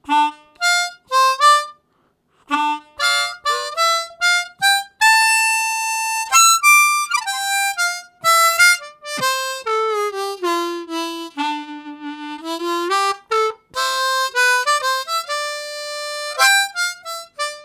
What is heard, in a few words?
Long Jump, Harmonica Riffs; Zero G